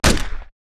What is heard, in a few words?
Small Gun Shot; Adobe; after effect; Action Essentials 2; Bonus Sound FX